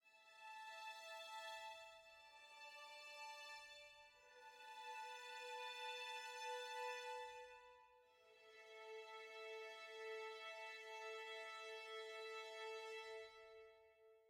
Ambiant; atmasphere windows gamerip 2018

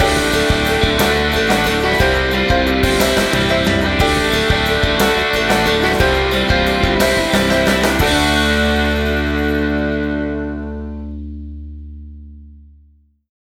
Jingle, golf; ProStroke Golf World Tour 2007; PSP

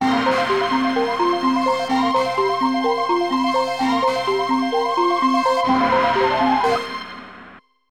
Prehistoric Realm Fanfare; Glover; Nintendo 64

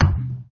Minigame Ball hit wall; papas cupcakeria online windows gamerip 2013

